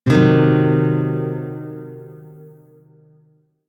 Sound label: Blues Chord D; Music; Shawzin; DE; Warframe